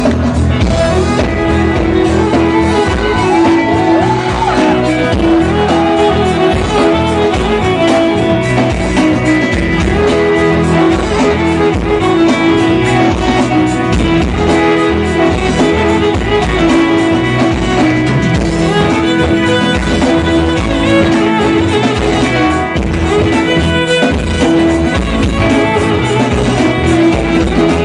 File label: instrumental ringtone; Animal BGM